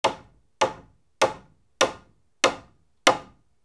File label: hammer Wood; tools Bangs